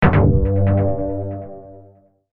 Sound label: goal enemy team